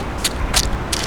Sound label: Leaf Walk; Outside